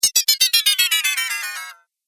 Fall Into Trap, video game; Twistedtracks

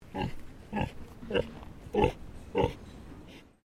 Animal Pig Landrace Grunt Sequence; Peaches; Edgar's Mission Farm Victoria; Pigs; Animals

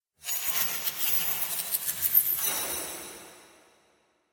Magic Illusion; Magic Sound Effects; Magic - Illusion 06; Role Playing Game

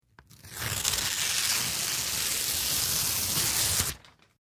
Paper Rip Foley Tear; Materials

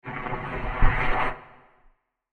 Horror Creature Transition With Reverb; Scary Soundscapes; Crafted Signals

